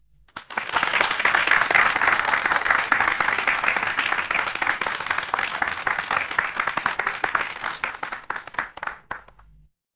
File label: crowd applause